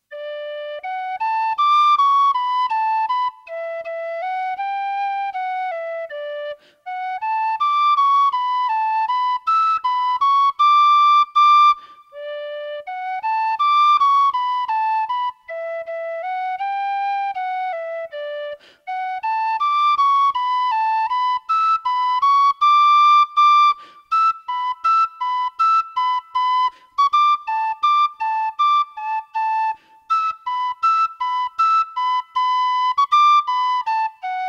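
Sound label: Kerry Polka, Traditional Irish Music; Ballydesmond No3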